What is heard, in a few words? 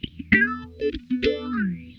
SOFT FAZE, Funk Guitar; 90 s Sample CDs; Zero G